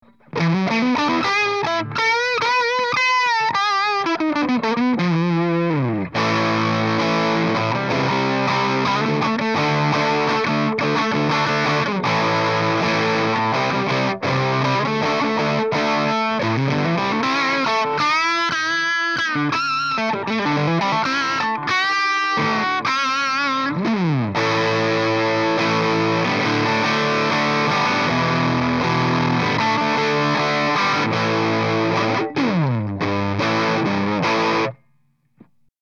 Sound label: disto ECC832